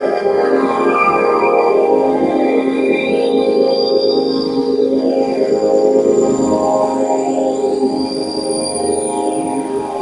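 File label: DIRTY JUNGLE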